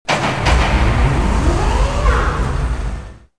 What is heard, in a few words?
block start; movers objects